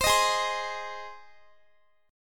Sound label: G sharp Major, Guitar; strummed; x,x,22,20,20,19